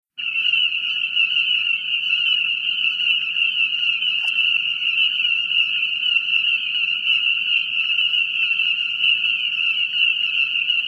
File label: Frogs Chorus